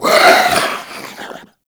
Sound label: Head Shake; Warframe; Stalker